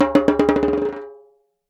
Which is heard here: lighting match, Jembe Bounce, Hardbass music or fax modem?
Jembe Bounce